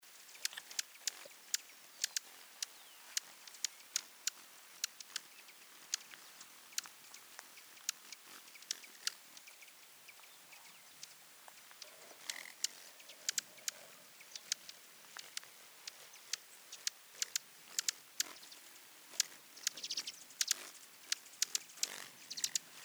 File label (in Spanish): Colibri del Atacama Rhodopis vesper